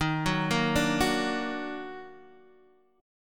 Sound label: Eb Minor Major 7th Eb down Guitar Open D, chord